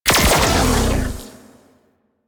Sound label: Shot C; Warframe; Weapons; Corpus; Vandal